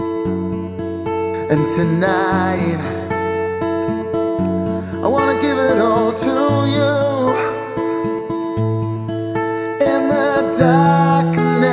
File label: Acoustic